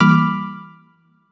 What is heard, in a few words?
Error